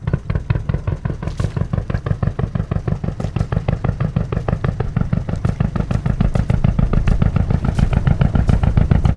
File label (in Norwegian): fiskebat